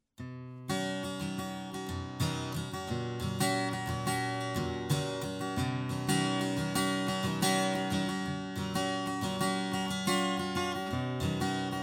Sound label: 114ce C414 Strumming; LTD